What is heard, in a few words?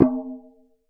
Processed Ethnic Percussion